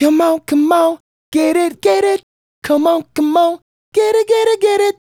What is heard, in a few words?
Male Solo, Vocal